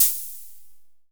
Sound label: 501 HHO, Fricke MFB 501